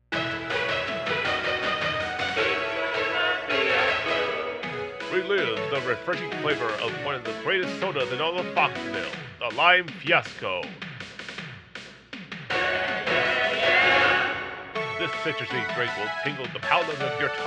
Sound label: theme song 80s style